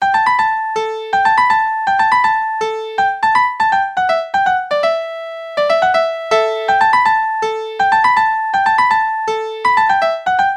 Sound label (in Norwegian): Udelt takt, Springar